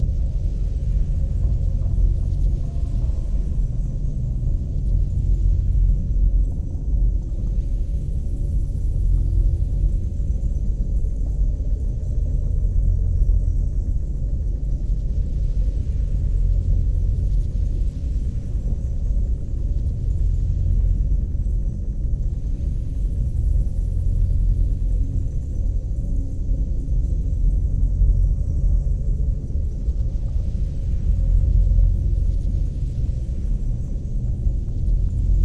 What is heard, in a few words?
megastructure hyper relay idle; Stellaris; overlord; Megastructures